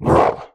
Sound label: mummy attack; spawners mobs